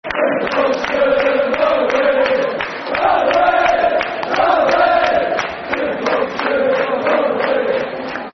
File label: All The Way [Football Chant (Soccer Songs)]; Preston North End Pne